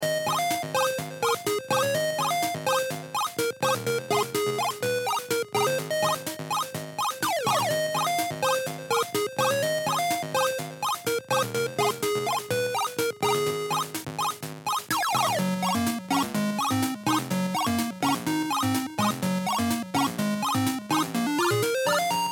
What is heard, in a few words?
Atari ST Victory; Bubble Dizzy; yolkfolk; game music